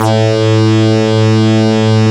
FM Synth Bass, Roland

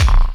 ASR-X Kick, Ensoniq

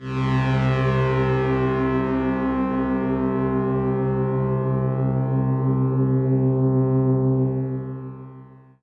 Pad C, Synth Prophet One Shots; Pads; Electronisounds

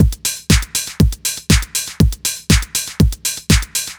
4 floor beat, trance

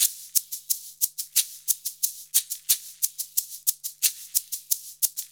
90 MIX, Percussion Loops

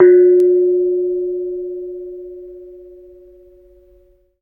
KENONG F4; Spectrasonics; Heart of Asia

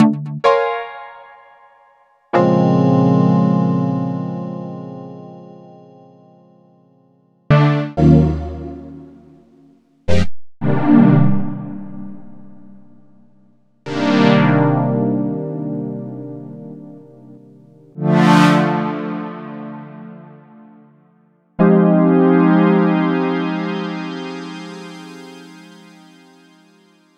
Chords; Electronisounds

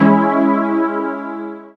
SYN PAD, dance synth; Sound & Vision - Gigapack I CD 2 (Roland)

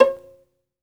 SOLO VI, Synclavier Strings; ILIO

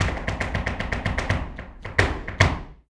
Flamenco, ZAPATEADOSOL

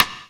rim, Emu Sp12; Drums

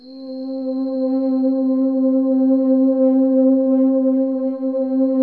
PAD C4; Best Service Gigapack 1

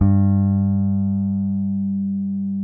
P BASS SUSTN MP G2; Sampleheads; Will Lee Bass Library

